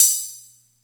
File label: Music Percussion Computer HH open, Drum Machines